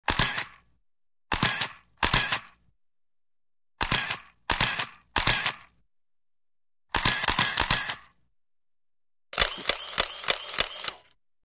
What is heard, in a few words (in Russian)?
zvuk fotoapparata v studii